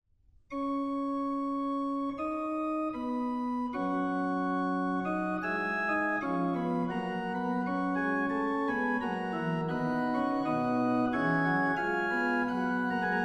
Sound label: organ voluntary; Tomkins